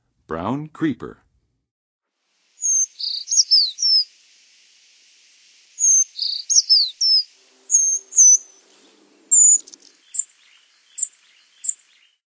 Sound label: Brown Creeper, Songs of Birds of North America; Western USA; Western USA Songbirds